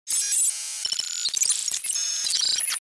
Digital hologram interface corrupted data; Sci-Fi Computer

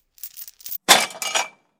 Metal chain lock locking; gfxsounds

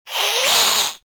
Vampire hiss monster aggressive

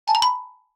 Text message alert

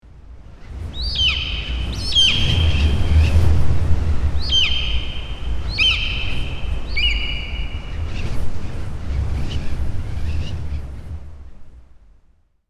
Hawk and eagle flying wind; bird

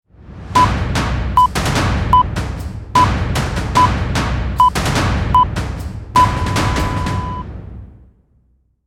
Cinematic countdown

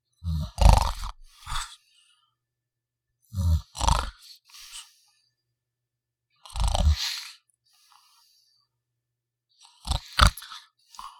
Man snoring short inhales and grunts

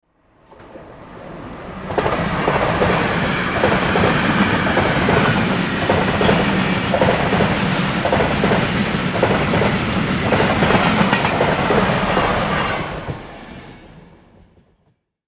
Train approaching and passing by; vehicle

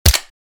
M1911 pistol suppressed shot close perspective

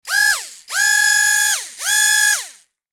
Small RC toy servo motor whirring

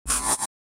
Glitch accent broken robot

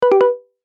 Infographic call answer notification

